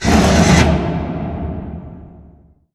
sliding door lg verb open slide; beacon; Titanfall 2

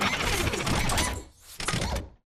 pain knockback backward; Titanfall 2

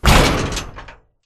Assembly Vent Exit Impact; Boomtown; Titanfall 2

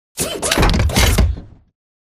dodge left; Titanfall 2

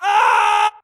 grunt death crush; Titanfall 2

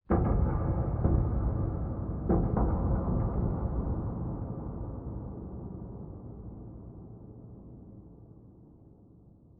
Metal Stress; Boomtown; Sideways Town; Titanfall 2

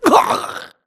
grunt death neck; Titanfall 2

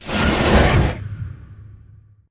sliding door ext verb close slide; Titanfall 2

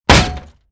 bullet heavyballistic titan; Titanfall 2

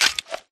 smartpistol reload mag out; Titanfall 2

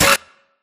Screen Glitch Short; Boomtown; Titanfall 2

